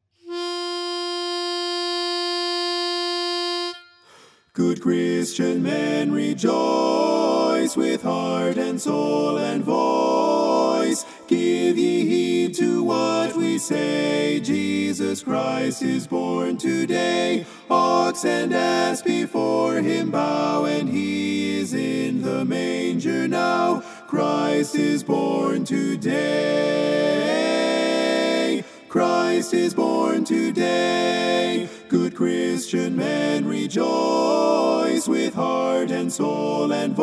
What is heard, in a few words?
TENOR Good Christian Men Rejoice